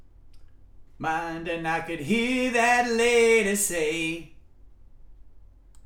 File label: vocals